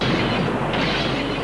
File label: crash